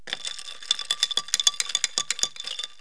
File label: tumblers, foods; KABOOM! - Wild and Wacky Sound Software; house